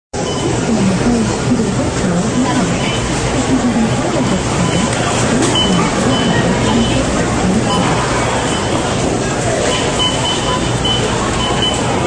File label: Grocery Amb